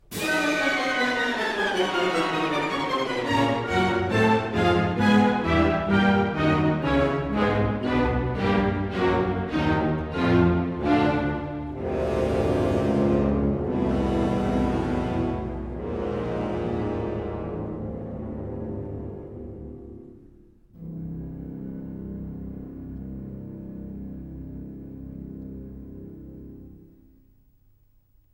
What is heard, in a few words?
decrescendo